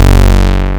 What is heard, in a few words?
Basse, techno acid